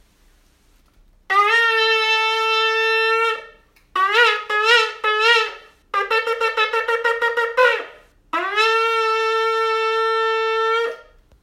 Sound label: Shofar Call